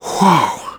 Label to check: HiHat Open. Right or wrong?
Wrong